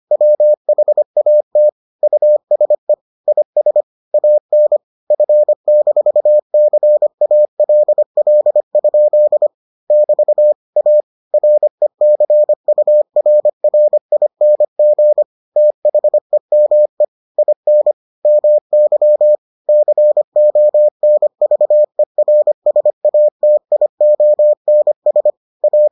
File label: morse